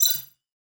Countdown; Rizline

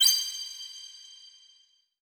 multiplayer button Ready; Rizline

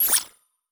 button Open Player Cards Interface; Rizline

